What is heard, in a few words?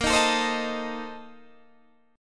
Bb Major 7th Suspended 2nd Suspended 4th, Guitar, Open D